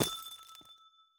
紫水晶踩踏声

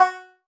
音符盒班卓琴声

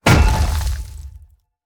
重锤地面重击声

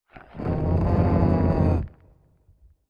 环境声; 监守者

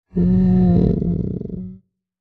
待机声; 嗅探兽

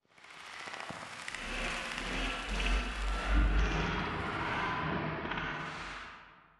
重生锚环境声; ambient

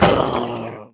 潜影贝死亡声

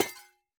铜灯破坏声

